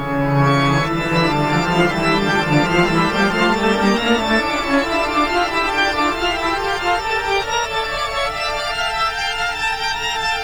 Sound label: organ